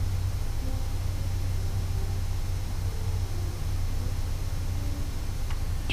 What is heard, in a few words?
girl singing